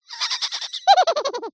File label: cartoon laugh high pitched